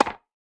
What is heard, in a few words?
Racket Weapon; Going Under